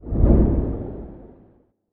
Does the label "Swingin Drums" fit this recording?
No